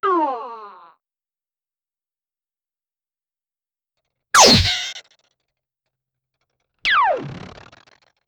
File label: a classic cartoon slip and fall with exaggerated sound effects